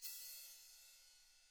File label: BRUSH CYM; Drums; ILIO; Double Platinum Drums 1